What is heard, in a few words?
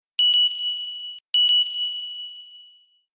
Pulse Sms